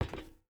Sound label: footstep boots metal walk; Rust